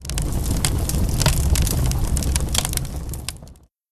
Diorama Fireplace; Candy Crush Soda

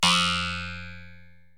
bumper; lego ninjago rise of the snakes

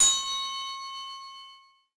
triangle; lego my style preschool

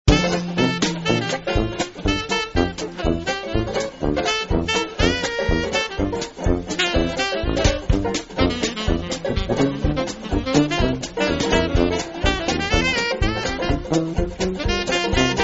God rest ye Merry Gentlemen (jazz)

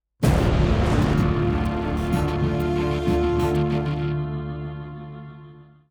190bpm

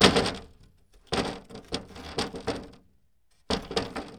window; a dump in the dark

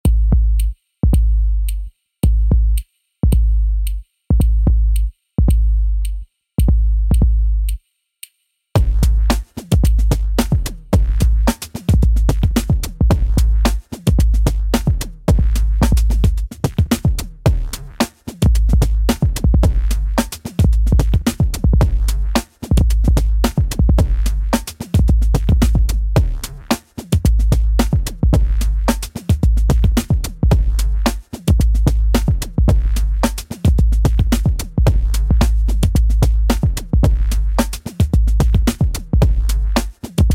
Hip Hop

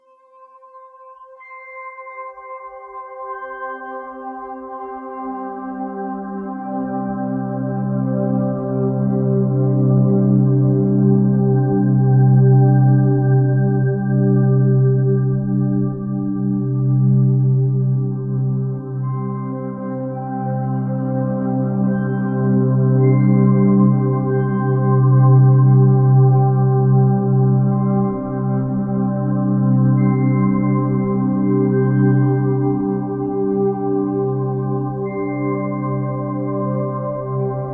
amb forgotten shores

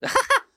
Jump; Collector

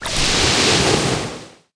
Water gun; The Denpa Men; Android

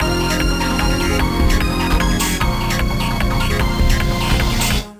BGM STAGE BATTLE; Phantasy Star Zero

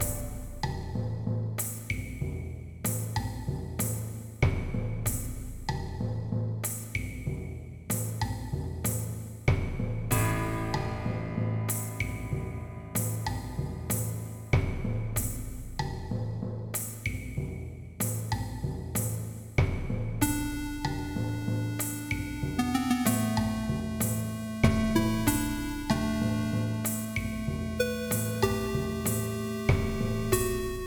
Calm Loop

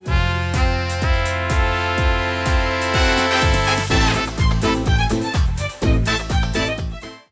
Video Music; Giftpack

